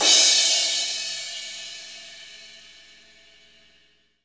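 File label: cymbal crash; Best Service Gigapack 2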